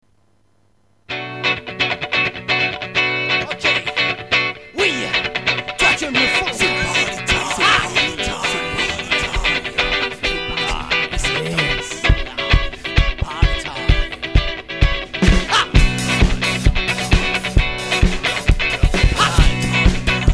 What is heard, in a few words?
happy funky